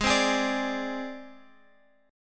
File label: Ab Major Flat 5th, down, Guitar, Open F; strummed